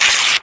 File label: rip, present